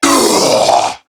Death, Male

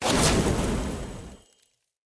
Short Sword Fire Whoosh; Weapons; Elemental

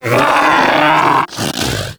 predator vocal death; Warframe; Hunting Critters; Characters

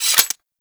Pistol Reload; Guandao

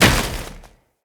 Moa Step Snow, footsteps

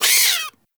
Forest Rodent Vocal Pain; Vocals; Warframe; Characters

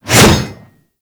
Axe Heavy Swing; Warframe; Weapons; Swings

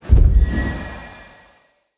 HUD Ability UI Ability Ready Two

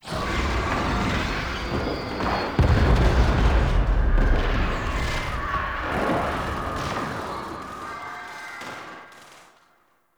ambient battle distant; Warframe; Tau

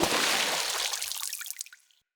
Impact Debris Medium Water; Weapon Impacts

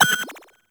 Missile Warning; Archwing

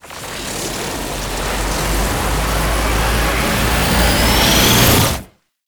Incarnon Wrist Gun Alt Charge Up, Weapons; Warframe; Tenno

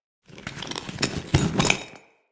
Witch Station Material Texture, Ambience; Soul Plane

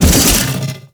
Jump, Foley; Warframe; Sentients; Erra

